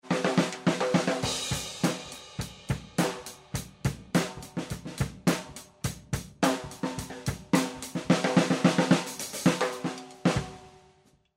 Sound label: OverHead